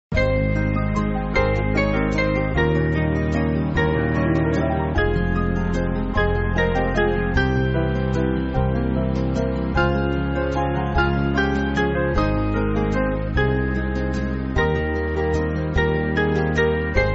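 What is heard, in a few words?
Band, Backing Files for Churches; Yield Not To Temptation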